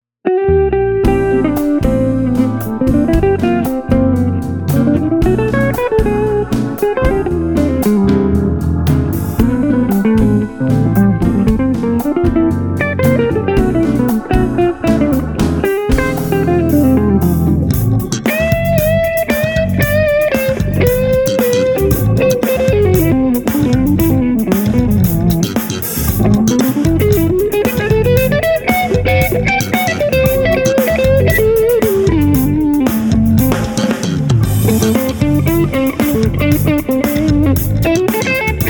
funky

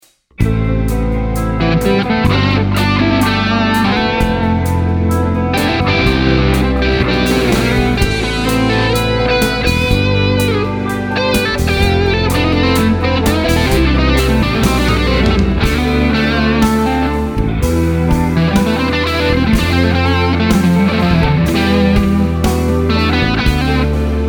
blues

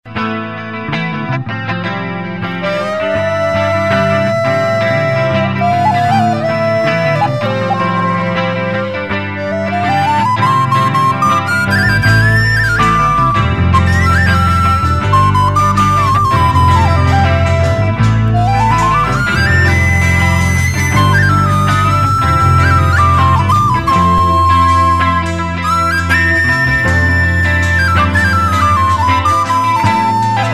flute

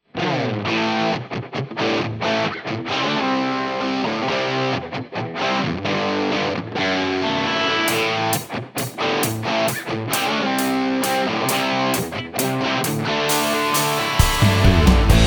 classic rock

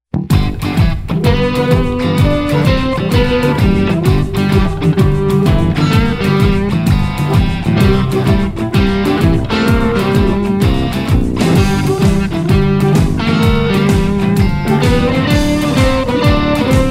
chicago blues des seventies

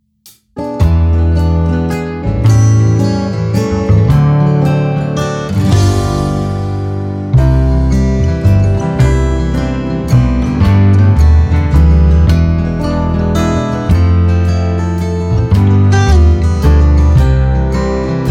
Ballade country

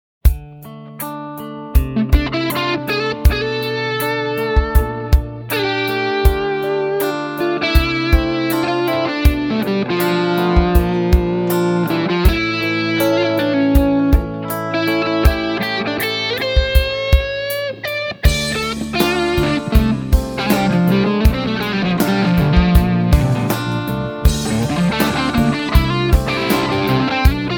blues

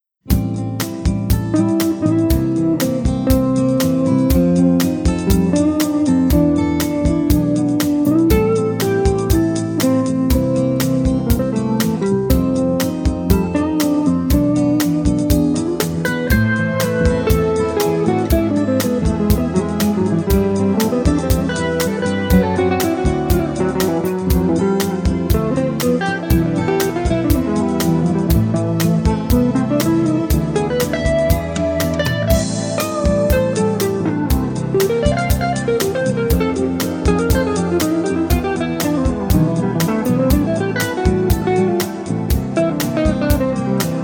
guit

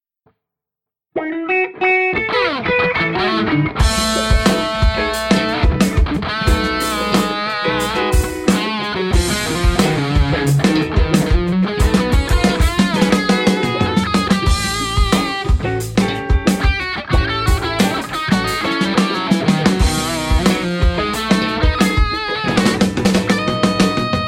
indian funky groove

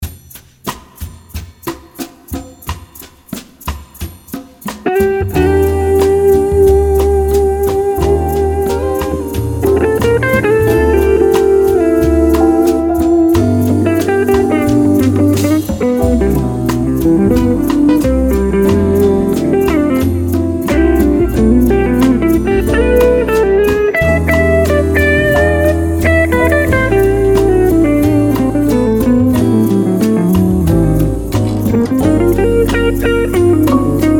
Bossa

